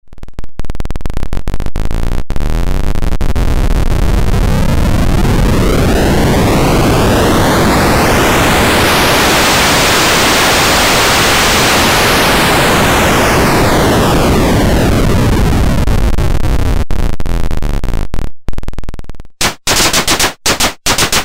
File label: Digital Noise; Io Instruments Himalia